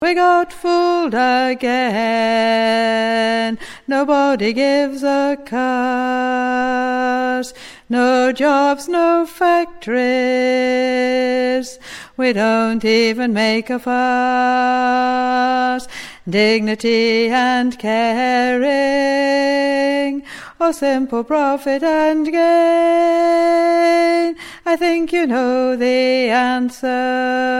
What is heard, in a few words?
bass chorus